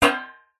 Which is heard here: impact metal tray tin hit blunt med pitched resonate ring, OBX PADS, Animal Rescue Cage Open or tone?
impact metal tray tin hit blunt med pitched resonate ring